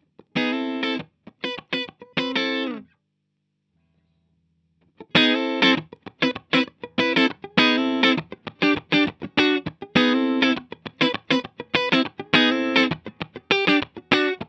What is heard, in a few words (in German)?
Teletronix LA-2A Bypass dann Peak Linksanschlag; UAFX